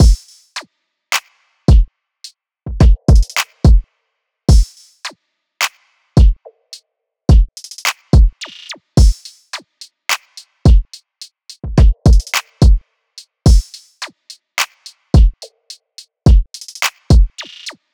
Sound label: Voyage Drum Loop - 107 BPM; Cymatics; Vibes II Drum Loop Collection